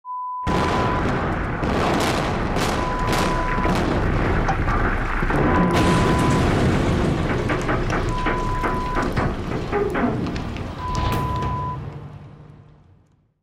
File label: Explosion sound